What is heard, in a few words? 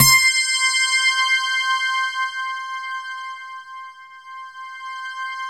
HEAVN GTR, JV-1080; Syntec; Wall of Sounds